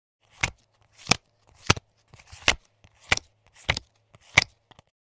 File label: Playing cards poker blackjack dealing cards